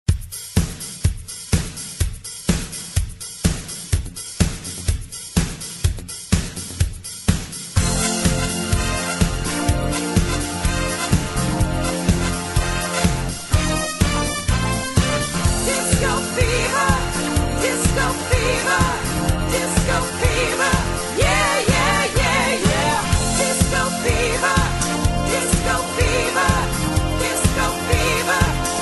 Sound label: disco; wcw